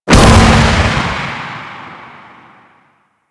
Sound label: Reckoning Explosion; Warframe; Warframes; Paladin